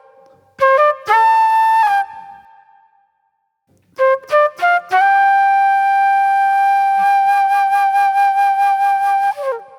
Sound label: Acid Jazz Flute